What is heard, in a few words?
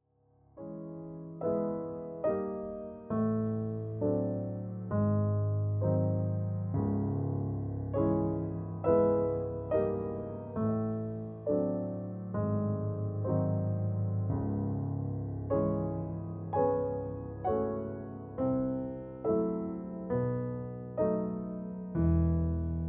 Prelude in C sharp minor; classical piano favorites from the romantic period vol 1